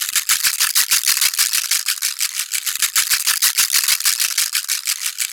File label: ETHNO PERCUSSION 90; ethno2